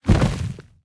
footstep; smite; geb

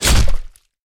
melee impact; smite; god odin

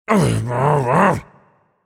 grumble; chaac

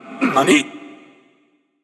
surprised; smite

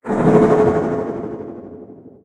cleave spin; smite; thanatos

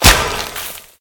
large impact; smite; thanatos

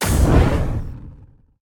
projectile; smite; poseidon

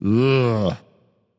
ugh; chaac

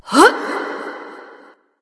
grunt jump light; smite; voicepack; hel

